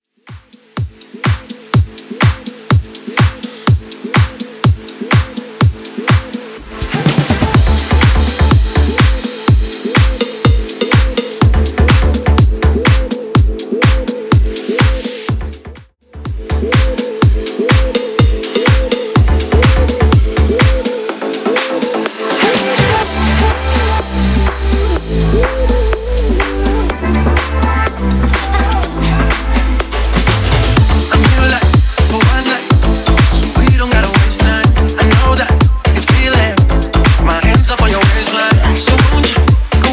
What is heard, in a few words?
124BPM; BeatShow Editz